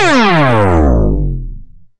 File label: death sting